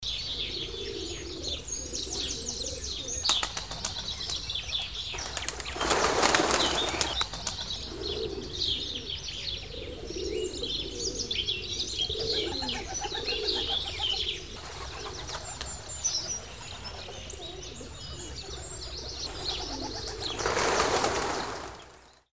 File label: birds at the pond